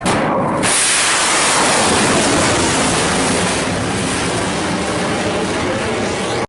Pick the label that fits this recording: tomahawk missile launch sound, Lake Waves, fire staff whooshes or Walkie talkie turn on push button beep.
tomahawk missile launch sound